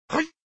Security Warn; bobtherobber2

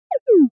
Camera Warn; bobtherobber2

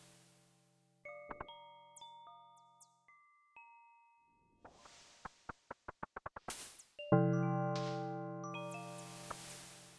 tiny village; adarkroom

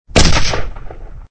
Shoot; bobtherobber2

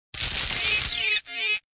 Robot Shock; bobtherobber2

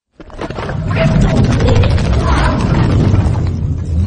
asteroid field; crossyroad

